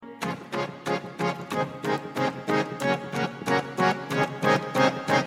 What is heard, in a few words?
Jazz Repeat